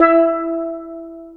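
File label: steel drum; Best Service Advanced Media Trax Modern Composer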